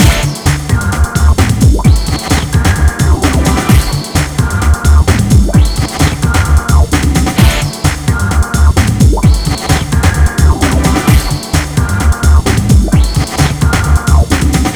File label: Hardcore Breakbeat, 130 Amin; Big Fish Audio - Hardcore Breakbeat Action; Hardcore Breakbeat Action CD1